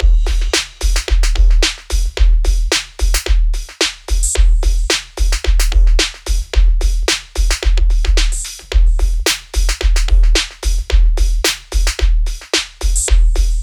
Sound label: junk hip hop 110bpm